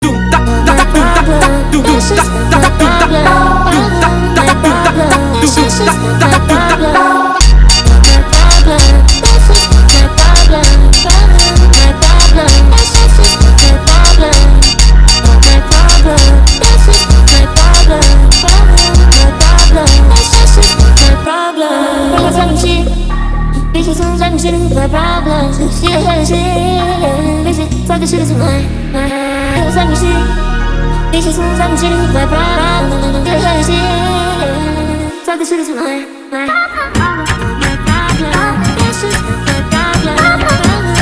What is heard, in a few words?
funk remix, Brazilian Funk